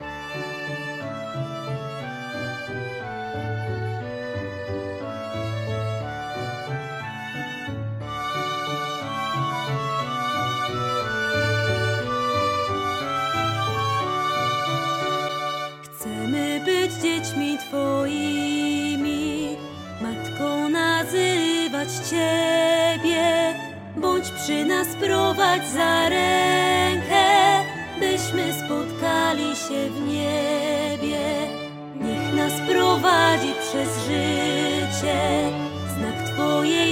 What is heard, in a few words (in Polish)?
HYMN calosc wokal